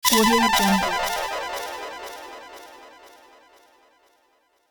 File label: failing echoes